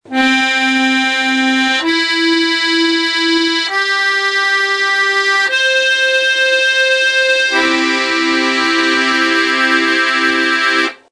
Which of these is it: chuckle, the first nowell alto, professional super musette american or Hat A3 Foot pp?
professional super musette american